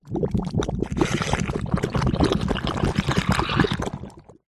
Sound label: cartoon slime gooey mess bubbling movements ott; zapsplat